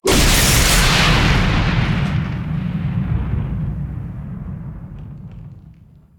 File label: Lightning Strike Impact; Evolve; Kraken